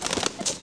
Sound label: Fight Chicken; anito; animals